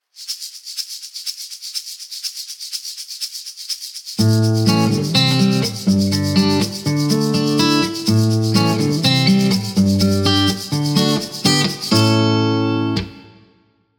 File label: notes musical and noise